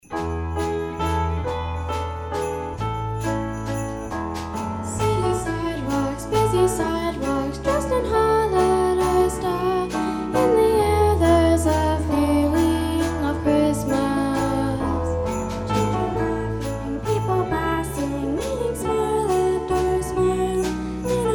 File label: Choir; Barton Hills Choir